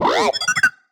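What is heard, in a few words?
Sci-Fi Droid Robot Emote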